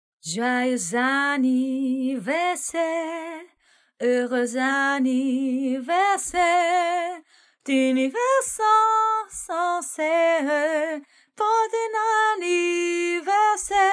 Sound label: Happy Birthday Song in French Female